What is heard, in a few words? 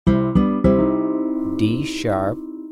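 D#3 Acoustic Bass Major Note Name; ear training instrumental color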